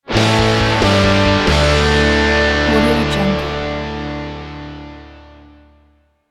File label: heavy rock logo; af-sounds